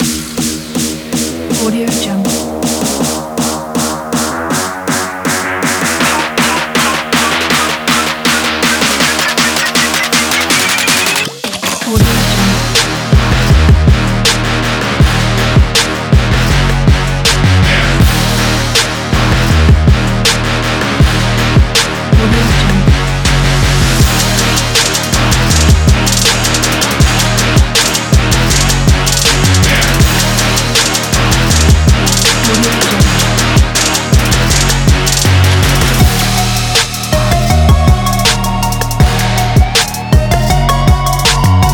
monster trap

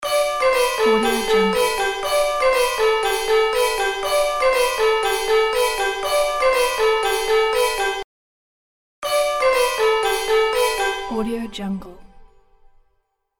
christmas short jingle loop

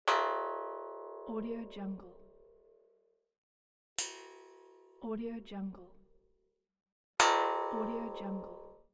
small metal hit

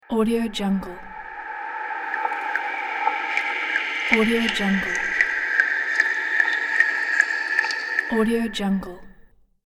magic cave swoosh

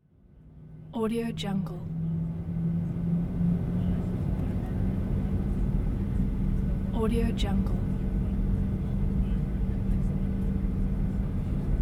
ambient sound from inside a plane taking off

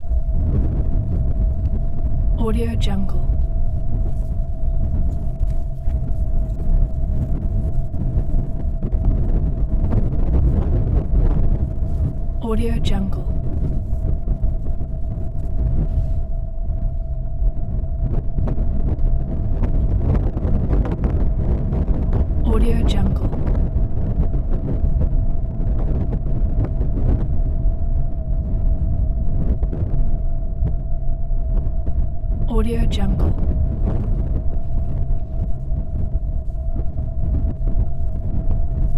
low rumbling ambience

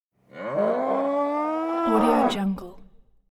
bull bellows; prosoundfx

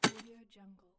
rake impact grass

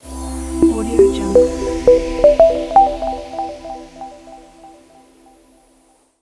synth logo ident

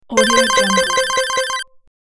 clear the stage bonus; sonic-boom

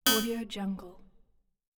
scifi interface synthetic button

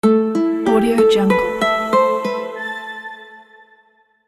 soft guitar logo

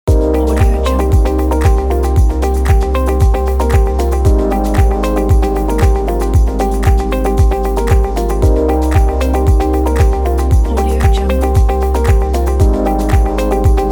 fashion loop; hotloops

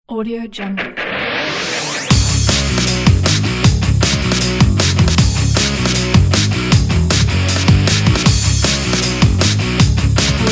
energetic breakbeat; vincentmusik

